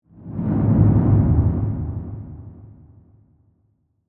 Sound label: Ghost Whoosh Dark, Low; Production Element; Ghosts; BlastWave